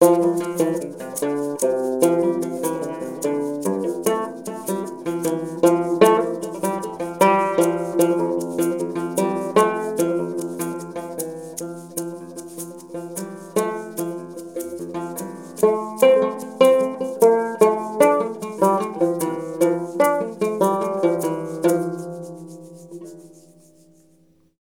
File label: banjo shakers; Koromanti1